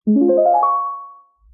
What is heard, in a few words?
Level Up